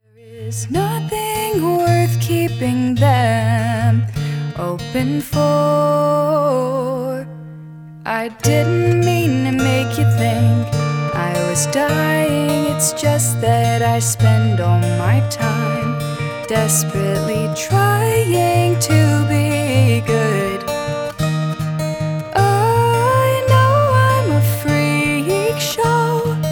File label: Funeral Hymn